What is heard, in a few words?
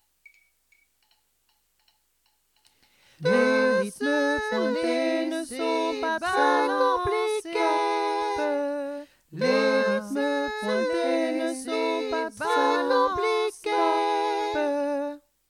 canon jazz tutti